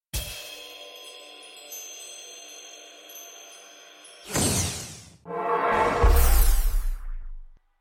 Portal Full

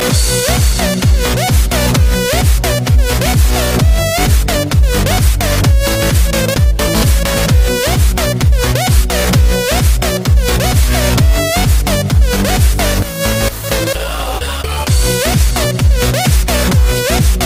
electrosaxobeat

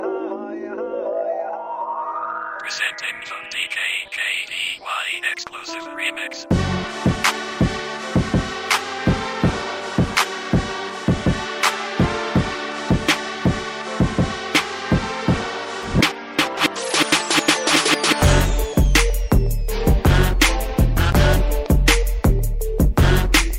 hip hop amplifier

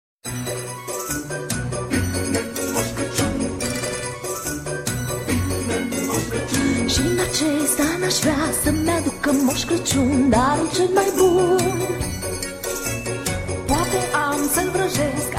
turtle remix

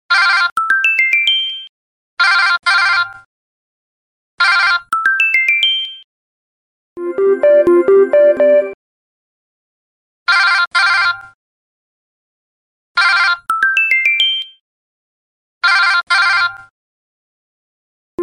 office ring

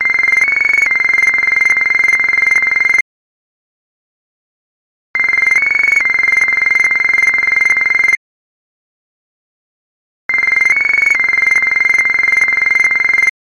Popcorn Maker

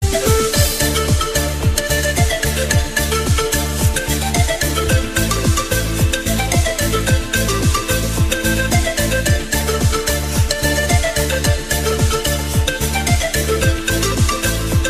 Pipes of Pan

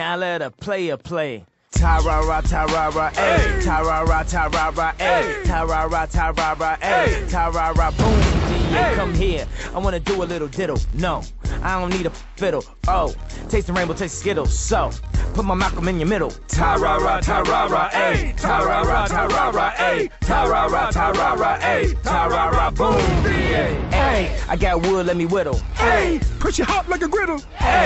Is Calling Rap, ringtones

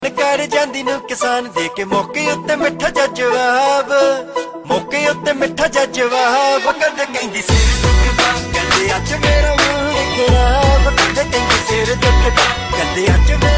sweet romantic tone; ringtones

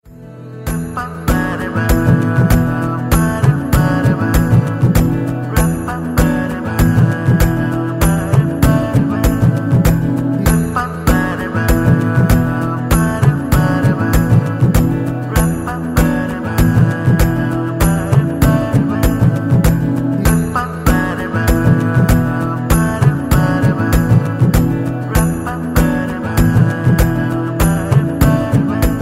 Melody Male voice

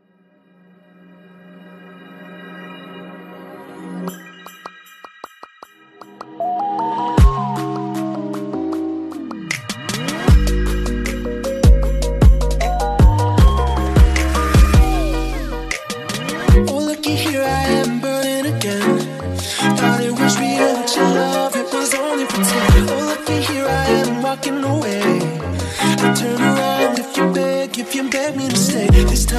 dark midtempo

